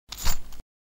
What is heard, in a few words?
coin bag; کیسه سکه